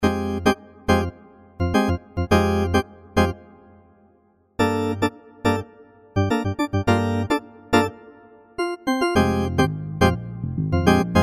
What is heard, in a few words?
organ tango